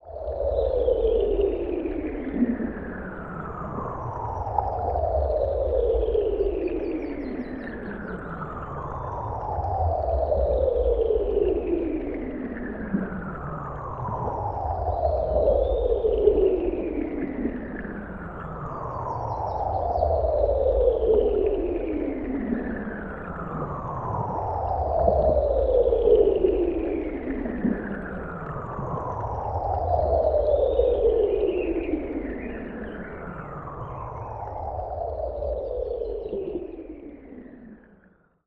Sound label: swooshing swaying sooth